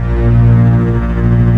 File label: ORCA CHORD, Synth FX Misc